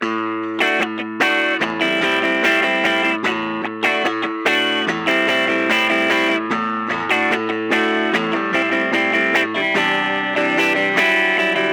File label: guitar